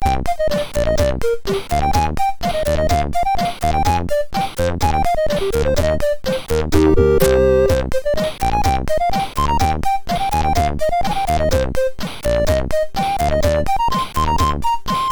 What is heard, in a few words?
A Cute Thing, sid MOS6581R4